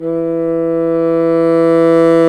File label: Bassoons